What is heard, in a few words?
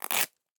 Gun Extra Clip Out Holster UnStrap Velcro; Nova Sound